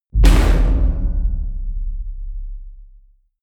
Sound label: metal crash ring small